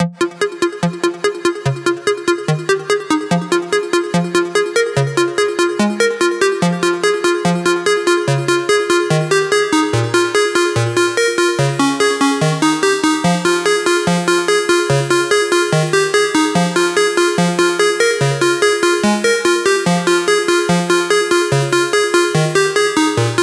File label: True Bells